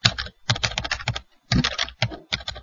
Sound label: Typing On Keyboard